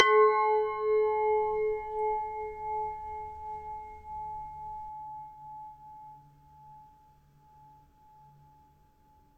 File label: chime wine dolce